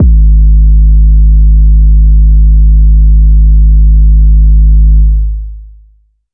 phonk kicks oneshot - 808 g sharp, Specially designed for phonk type beats, these nasty, layered 808 o; kick drum